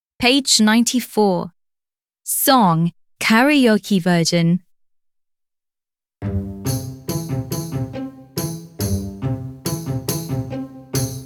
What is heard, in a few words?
song karaoke; happytoons; happytoons edycja polska; szkola podstawowa